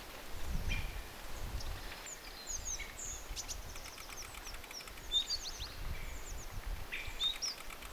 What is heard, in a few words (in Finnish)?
kapytikkamaisia punakylkirastaslinnun aania